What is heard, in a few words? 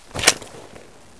laser draw, weapons